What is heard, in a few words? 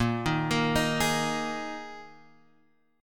A sharp 7th Suspended 4th A sharp, Guitar, Drop D; x,1,1,3,4,4